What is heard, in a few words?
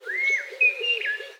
birdsong